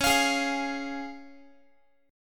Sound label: Db Major Db Guitar; strummed; x,21,20,18,21,x